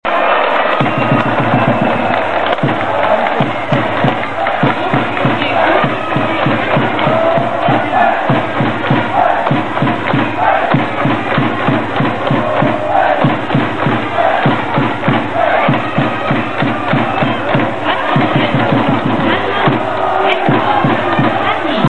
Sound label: hit, baseball; 一般に「○○！（行け！） ○○！（打て！） かっ飛ばせー！○○！」と言います。